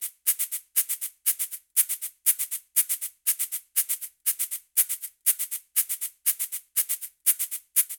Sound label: Jazz Funk B Cabasa 120bpm; Latin Percussion; Ableton; Factory Packs